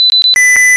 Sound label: Blip